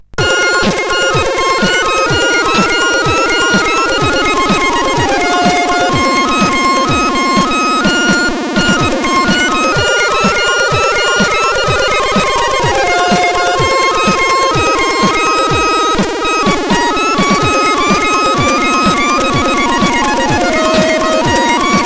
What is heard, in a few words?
menu, sid CSG8580R5; Music Collection 4